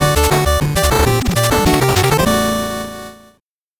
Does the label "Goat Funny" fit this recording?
No